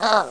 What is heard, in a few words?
ouch; Ferazel's Wand Demo; Ha!